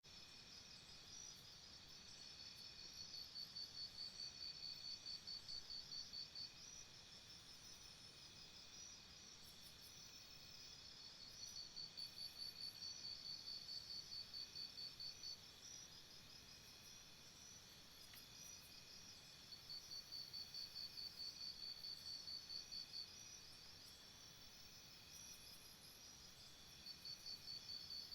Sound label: jungle atmosphere morning